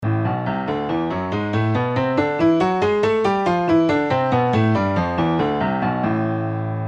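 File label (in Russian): Ля минор мелодический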